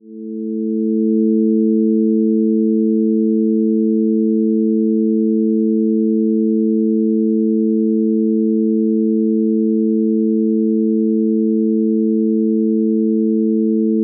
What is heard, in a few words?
music cinematic rise